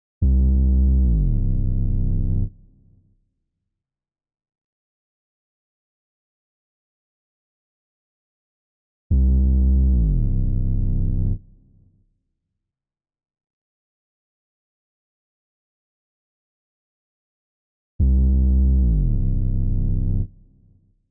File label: 108 BPM A Min Bass, loop stems; Melody; Cymatics; 2022 Melody Collection; Wavy; Space Invader